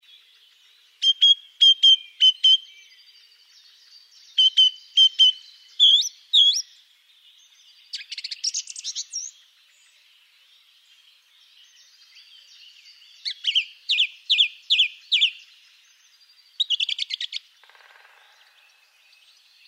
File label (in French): Grive musicienne